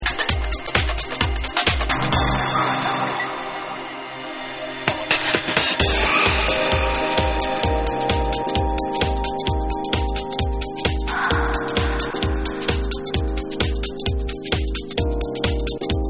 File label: ELECTRIC HOUSE